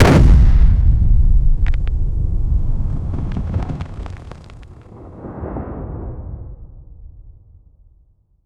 deep rock explosion stone, subterranean

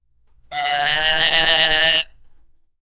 sound of cow on a, farmyard

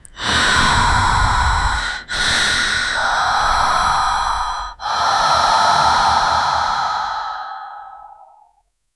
a young woman lets out, death

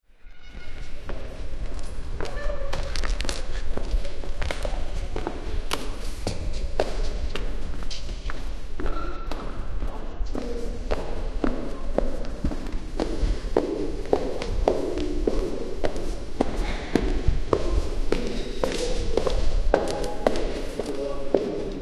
group of friends walking; exploration

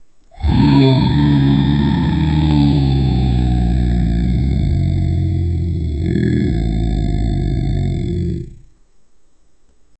an adorable cartoon dragon

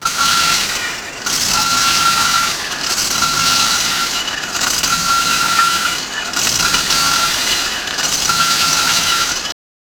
close up sound effect of, mining

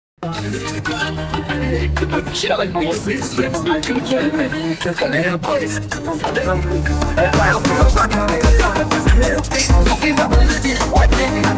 k pop

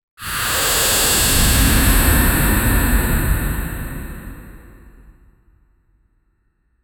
magicians puff of smoke

